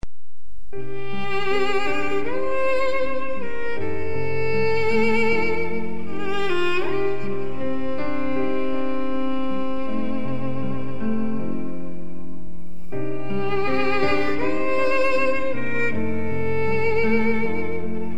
Japanese sad theme; Sailor Moon; sad saturn theme

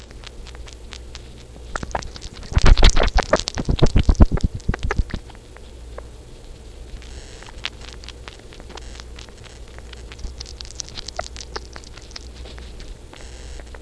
chirp, sugar glider

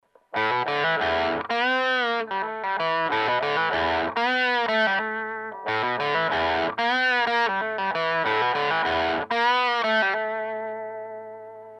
humbucker

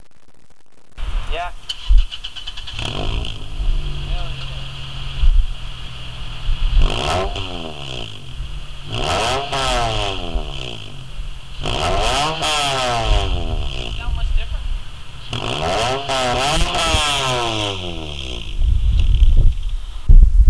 Silenced outside reved, Exhaust

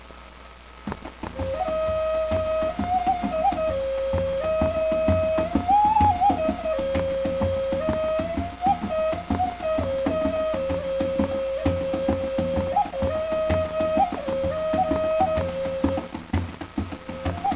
drum mix, Hand Drum + Native American Flute

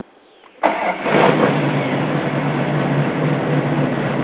start car

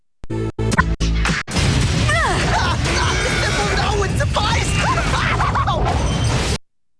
scream

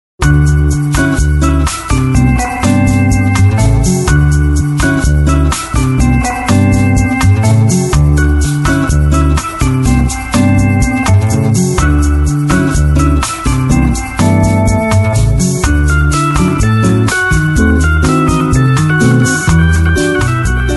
bossa mixer

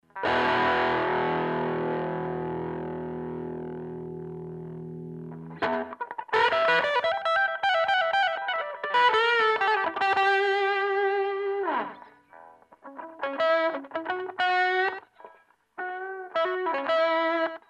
single coil

